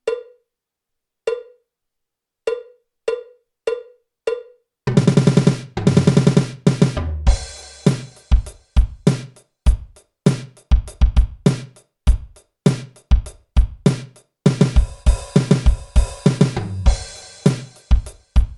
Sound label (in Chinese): 架子鼓独奏 摇滚融合; 66条架子鼓独奏SOLO